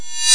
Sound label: open program